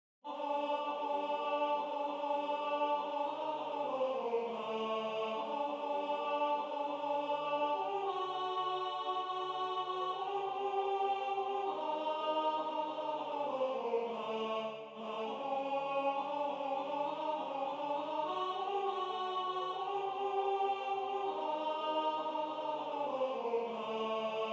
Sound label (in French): alto